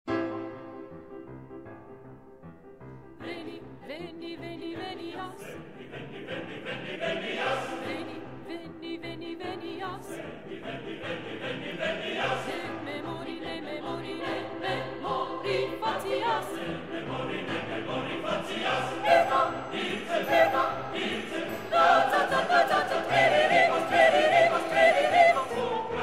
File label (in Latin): Veni veni venias Coro 2 Soprano up to tempo